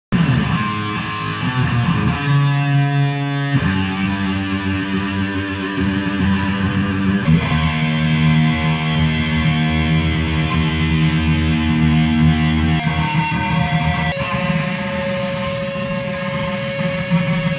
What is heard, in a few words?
Screaming Lead; MetallHead